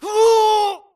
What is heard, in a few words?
grunt electro; Titanfall 2